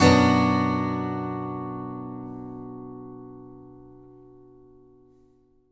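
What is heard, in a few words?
Acoustic guitar B minor up, Country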